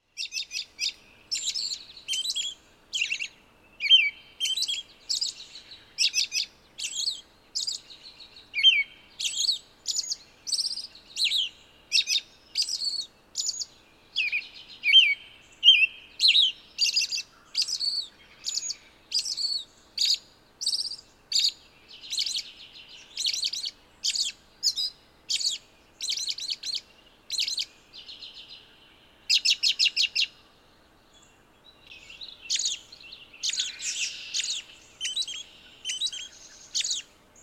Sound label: whisper song; sissely